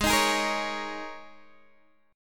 G sharp Suspended 2nd Suspended 4th, G sharp, down, Guitar, Open D